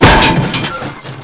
Metl Impk; panzer

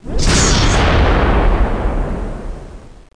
fire; Star Trek - Armada

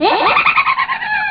laugh, speech

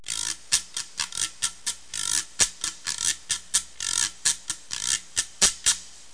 Sticks Rubbing; How Would You Survive (1995); Grolier

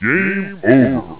Game Over; xpired

